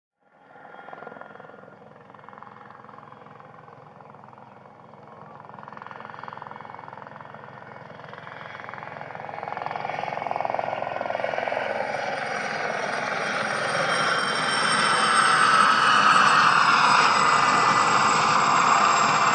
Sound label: Gazelle Apprch By Lan, aerospatiale